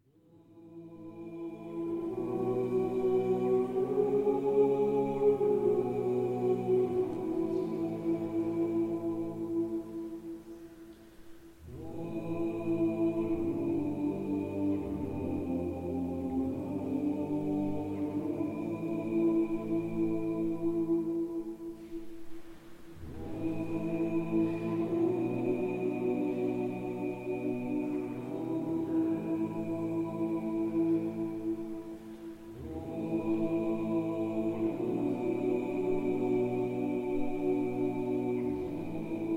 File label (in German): Quintgesang